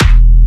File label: Jump Kicks, Bassdrums